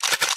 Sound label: loading end, crossbow; minecraft